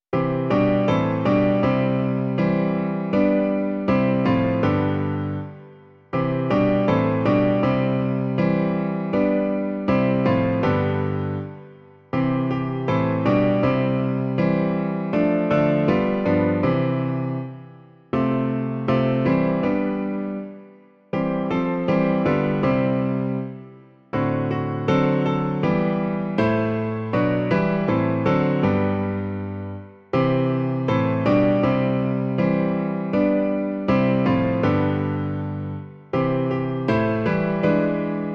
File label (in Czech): klavír; Důvěřuj se v Pána; Klavírní verze